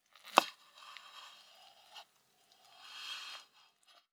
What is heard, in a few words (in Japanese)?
卵焼きを切る音